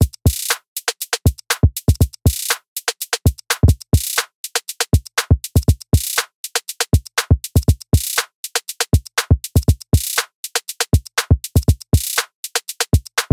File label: music short beat